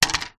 foley insulation tile set down ground; zapsplat

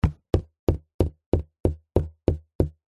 cardboard box small knocking on side; foley

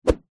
stick snooker cue swing air whoosh; foley; zapsplat

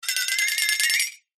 cartoon flexatone

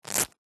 velcro rip first aid kit pouch

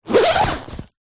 household plastic pillow or duvet bag zipper

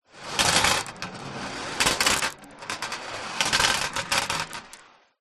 leisure marbles multiple roll on metal

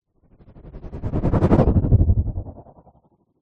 sound design sweep chopper stutter dark

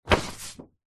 hospital xray sheet pick up from surface

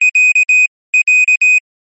technology pager beep

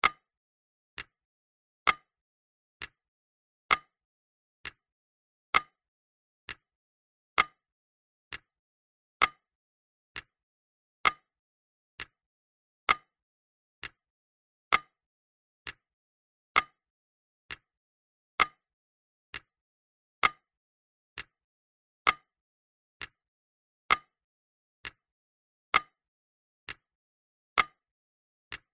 household clock old tick tock

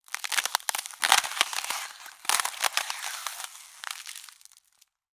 nature ice crack

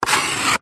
office chalk draw line on blackboard

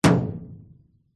impact metal on metal thump resonate large hollow

